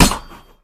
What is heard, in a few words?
Heavy Sword Blow On Chainmail; SyrinScape; Against the cult